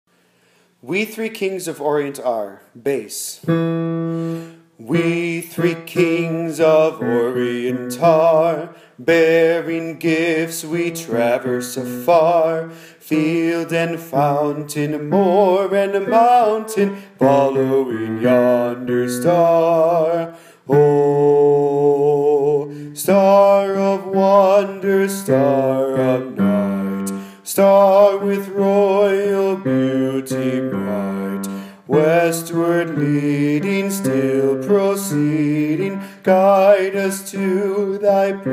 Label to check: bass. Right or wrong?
Right